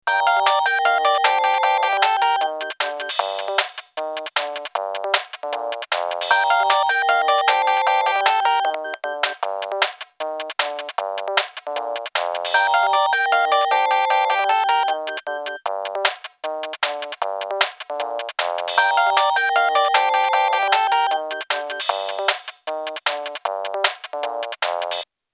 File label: Jungle Vibrations; siemens